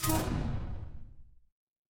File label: magic scifi portal